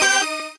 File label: normal hit normal